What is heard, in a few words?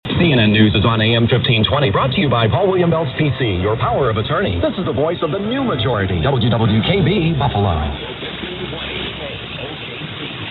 silence